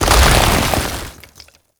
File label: Condemn Earth Crack; Warframe; Priest